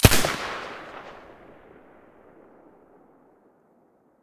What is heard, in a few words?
silencer mp9 stereo, weapons